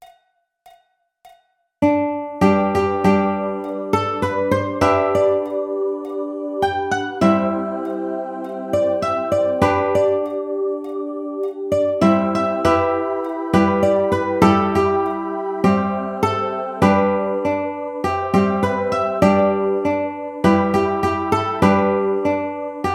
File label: Shenandoah Ukulele